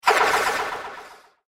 Laser Smoke Screen Gun With Echo; Laser Gun Sound Effects; science fiction sound effect

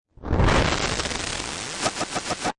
Scifi Production Element Startup Phase

